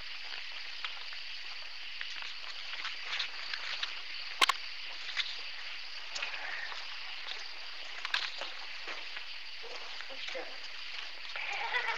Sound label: Laugh; Waverly; evps